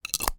medical hospital thermometer slide in plastic case; zapsplat